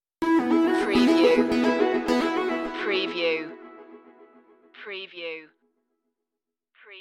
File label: boiling point stinger